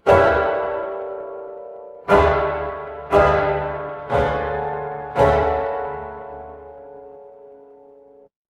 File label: horror stinger